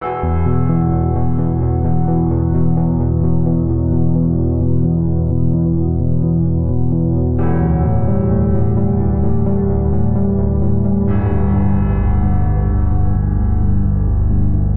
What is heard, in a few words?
Hopeful Arps - Emin 130 bpm; MIDI Clips; Tonal; Motifs; Ableton; Factory Packs; Mood Reel